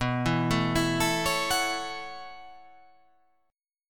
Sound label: B 9th Suspended 4th B down